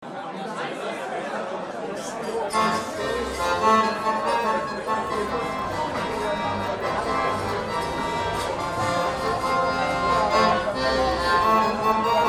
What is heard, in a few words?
polka